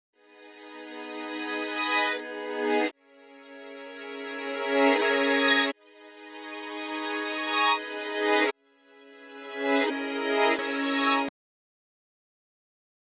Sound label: 86 BPM G# Min Reverse Strings, melody loop stem; Cymatics; 2022 Melody Collection; Mafioso